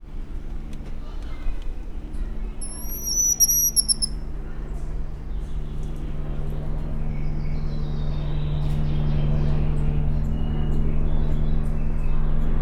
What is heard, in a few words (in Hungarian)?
majom repülővel, goeldi tamarin; szegedi zoo 2011